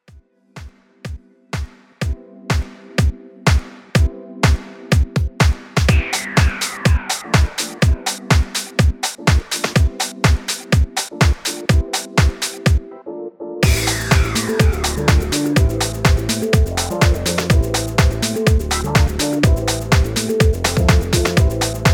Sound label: Remix Instrumental